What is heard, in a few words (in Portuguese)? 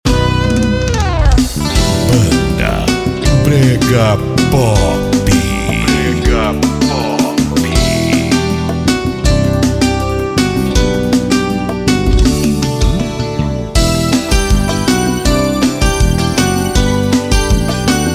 banda brega pop